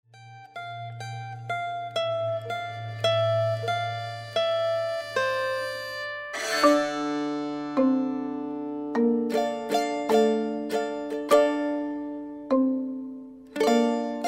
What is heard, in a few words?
carol bells